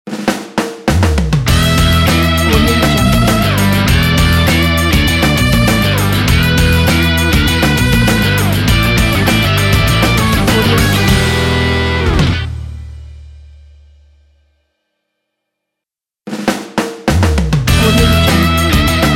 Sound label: energy rock logo